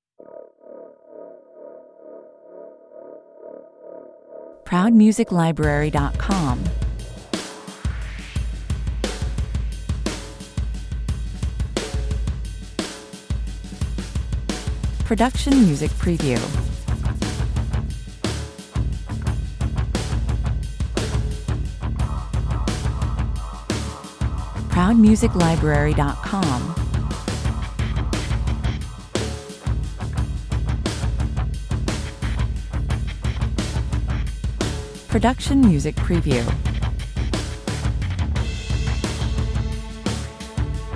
A back and forth and up and down, backed by a stuttering beat and virtuoso hard rock solis, 88 bpm